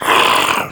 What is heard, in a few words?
death harpy, creep deaths; dota